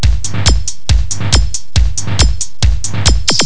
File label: techno